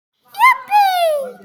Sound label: yipee